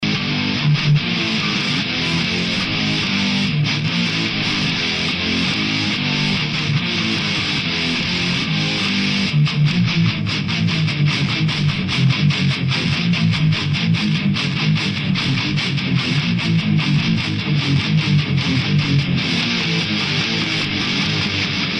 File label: gtr em